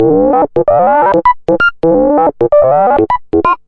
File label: stretching blippy bloop; SYNTH AND ELECTRONIC LOOPS